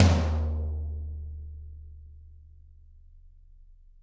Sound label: Toms, Drums; Bob Clearmountain Drums I